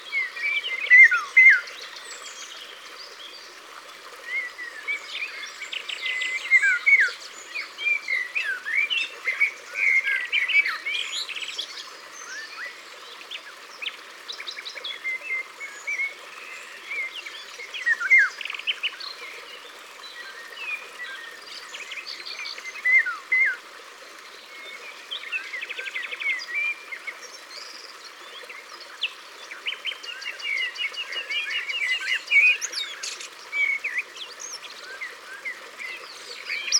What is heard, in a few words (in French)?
Loriot d Europe, Au bord de l eau